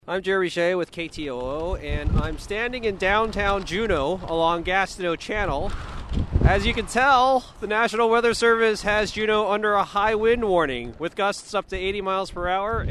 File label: wind